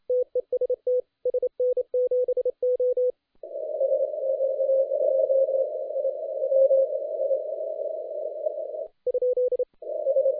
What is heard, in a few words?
CW 7MHz; contest; SQ2DYF de SN7O; TTC-SPCWC